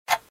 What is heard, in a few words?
Download failed; BenQ B502